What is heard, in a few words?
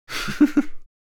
Adult man giggle chuckle; laugh